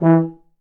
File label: E3 TUBA